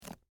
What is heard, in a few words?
Bible Grab, Handle, Book; Foley